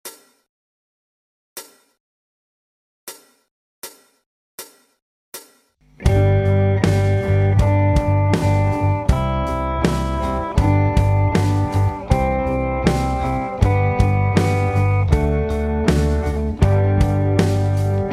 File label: Morgen kommt slow